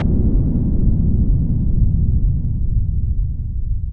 Bomb

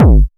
disto bd; percussion

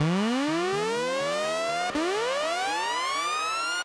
Atom Alert

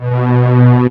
Double String low; instrumente

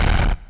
Distortion Short

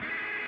Scrubbed Guitar

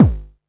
kick

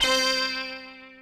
Synth

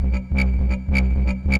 Tech Digeridoo

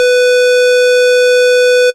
Sinus high; instrumente

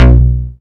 Square

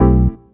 dance chord

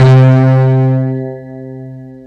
M1 & Ring Layer; instrumente

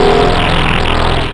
FX long

